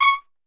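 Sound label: C#4 trumpet shot, Brass Super Section; Best Service; TRP SHOT; 90 s Sample CDs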